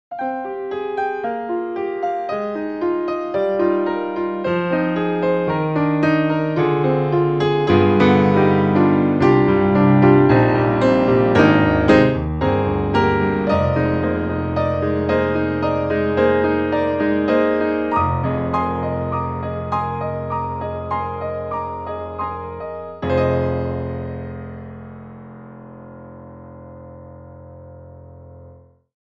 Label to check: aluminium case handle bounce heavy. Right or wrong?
Wrong